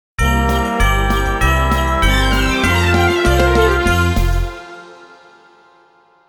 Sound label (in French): Noel link, jingle; Jingles medley